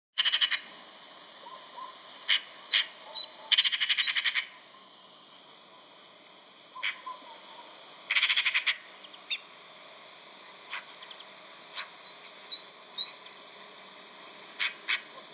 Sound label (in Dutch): ekster